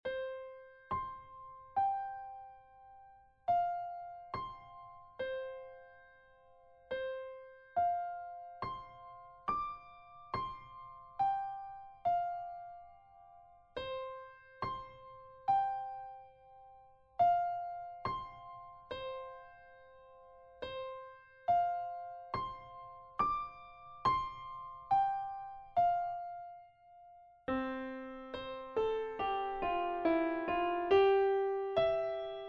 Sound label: Piano